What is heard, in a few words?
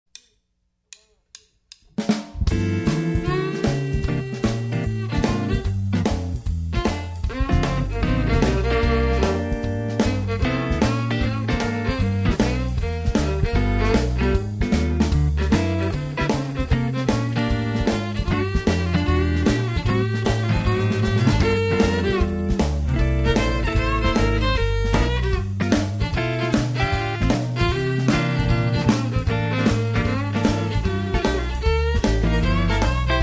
G Swing